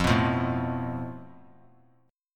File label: F Major Flat 5th; FMb5 strummed; JGuitar